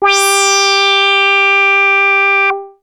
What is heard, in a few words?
Arp A G4, ARP Odyssey synth; musicradar-synth-samples